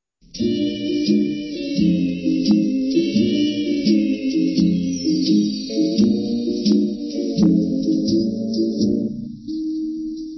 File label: with waves; backing track